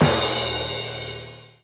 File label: Boom Boom Last Damage